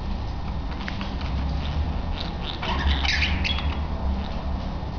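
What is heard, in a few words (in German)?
weibchen knurren, wellensittich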